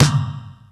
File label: Snare, drums; Ableton Live 12 Lite Core Library